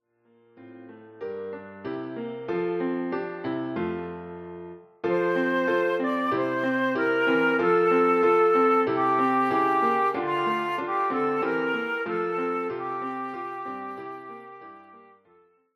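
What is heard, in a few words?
flute; Weihnachtslied für Querflöte und Klavier; Querflöte + Klavierbegleitung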